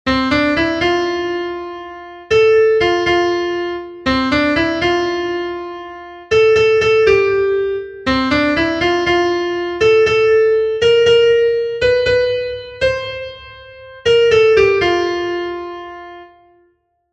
SOPRANO, himnos en piano; 322 EL ES EL REY DE REYES; cantos del camino

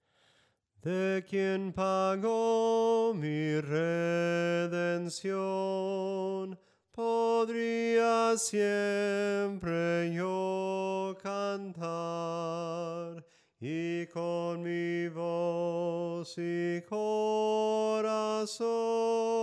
SOPRANO CANTADO

